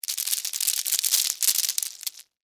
Debris Lima Beans; Texture; Materials

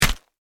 Impact Splat Crunch, Flesh; Blood And Guts Sound Effects; Horror

